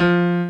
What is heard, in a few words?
piano